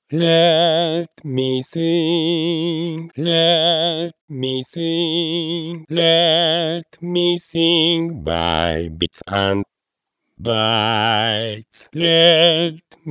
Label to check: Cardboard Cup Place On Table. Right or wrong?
Wrong